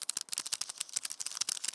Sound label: Spider